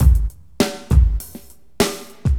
Drums, AcidJazz Funk

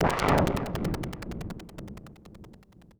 AMBNT 160; Ambient

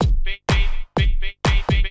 drum loop house 125; eJay

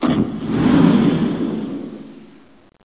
rock sin

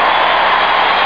crowd ros

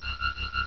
ufo; Handkerchief

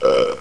BURP

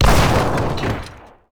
impact

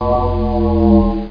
Bell Bend; Total Distortion Big Demo

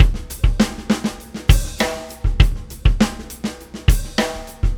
ProgRock 99bpm, Drums; ACID MUSIC

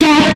FX shot, Drum+Bass, 160; eJay; Singleshots

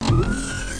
slide

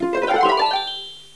telnet

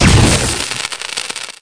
bomb; Fortress2; OnlineGame

